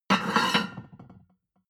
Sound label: plate on plate place slide medium, restaurant cafe; sport-freizeit